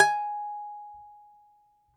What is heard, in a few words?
DOMRA, Russian Strings